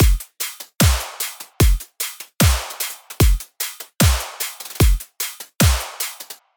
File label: drums loops fullkit 150; Unison; STRATUS